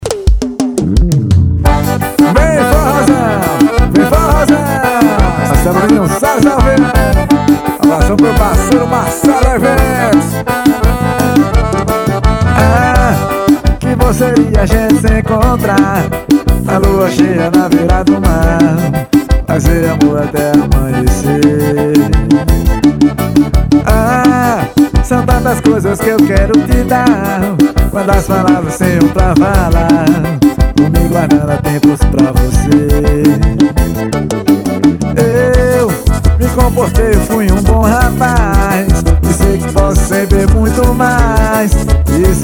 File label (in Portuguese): forró